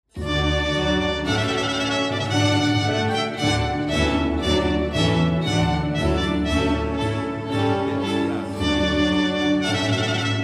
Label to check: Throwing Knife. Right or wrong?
Wrong